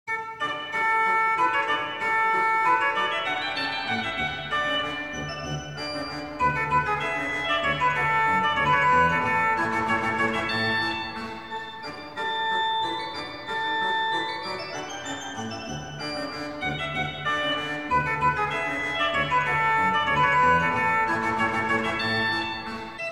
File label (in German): Marche in D-Dur; biggs bach book